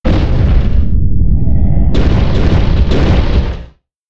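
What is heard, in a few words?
death, monster; mamuo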